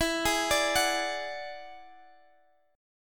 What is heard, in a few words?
E 9th E down Guitar Standard; arpeggiated; chord; JGuitar